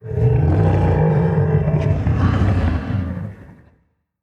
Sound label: death; Barotrauma; Charybdisold